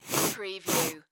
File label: Sniff Twice Male; sound effects bible